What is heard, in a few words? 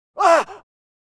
fearful gasps male; Parkour; Butterfinger Video Sound Effects; Additional Parkour Sounds